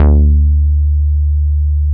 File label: MOOG1 BASS D2